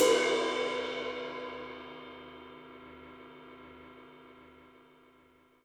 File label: HiHat & Crash, Roland TD-20; HiHats